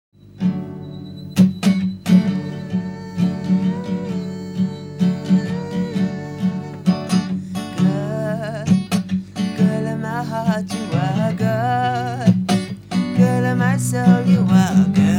acoustic; home demo

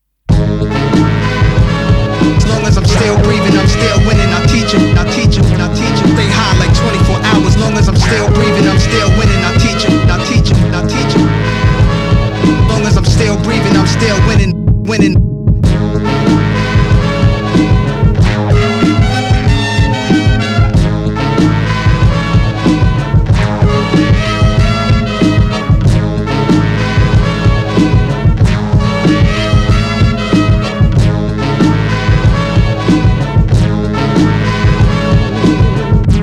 instrumental

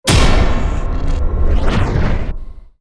hit complex short

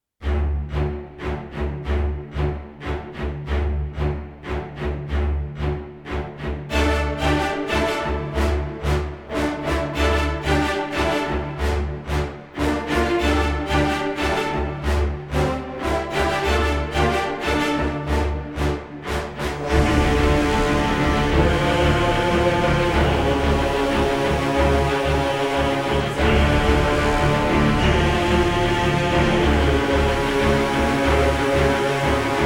orchestra and choir only; immediate music

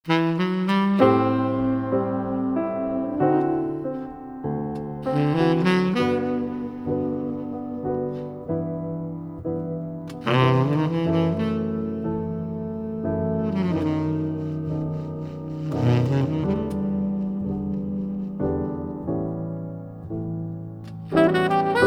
instrumental

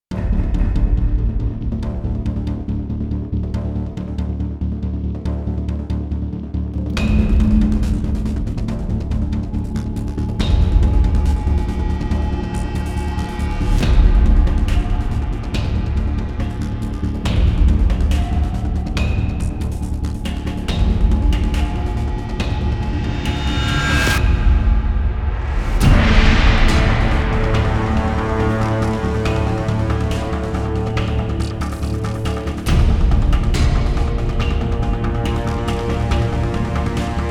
bass no vox build

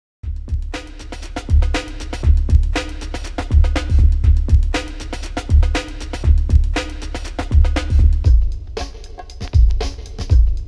bass beat

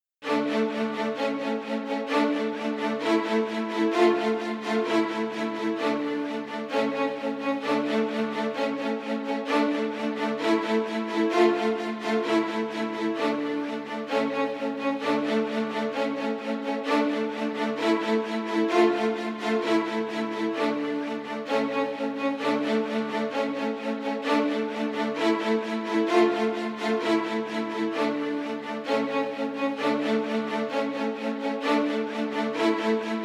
samara strings

